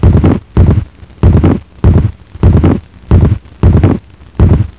BEAT

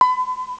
ding; Windows

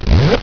ZIP

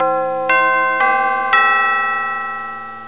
DOORBELL; Windows

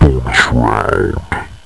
BONUS

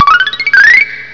jackpot

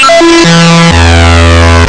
bass plus

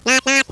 knock

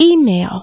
E-MAIL

